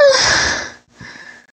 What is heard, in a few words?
Moan